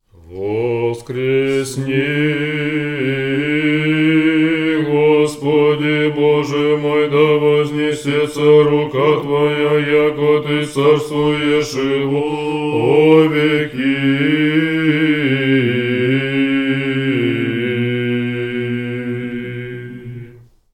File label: prokimen tone 3